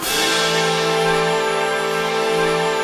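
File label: C 6 9, orchestra hits; Northstar - New Gold VOL-2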